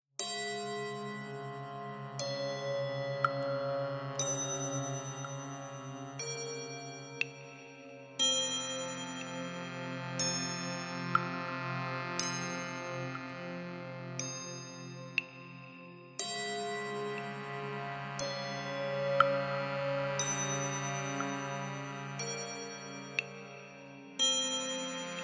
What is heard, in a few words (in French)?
CARILLON